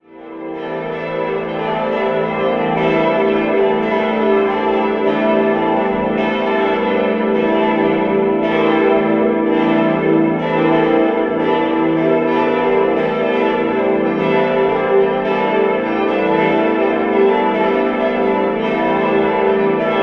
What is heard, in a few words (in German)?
Regensburg Konrad, Pfarrkirche, Glocken; 2020 von Rudolf Perner in Passau gegossen und ersetzen ein vierstimmiges Gussstahlgeläut des Bochumer Vereins.